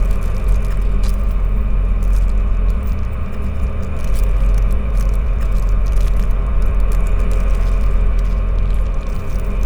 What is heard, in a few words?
ship juggernaut idle; Stellaris; biogenesis